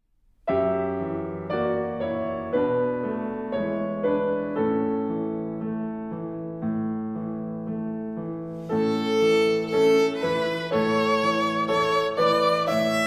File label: Slow Practice; Long Long Ago